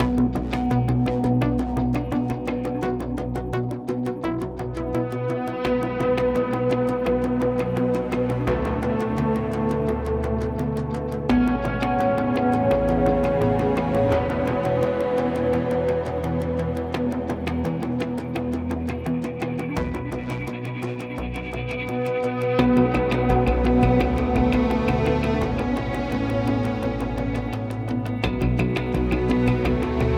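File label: Music Ambient, Ambient Loading 85bpm; Forza Motorsport 6 Apex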